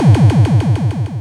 Doo doo doo doo

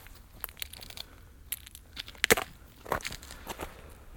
Ice loop

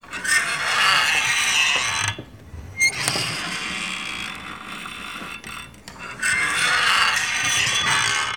Spring Door Screech

